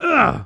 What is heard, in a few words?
grunt; Superbike 2001